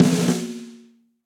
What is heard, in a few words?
Snare Press Studio Basic; Snare Articulation; Drum Hits; Session Drums Studio; Standard Hits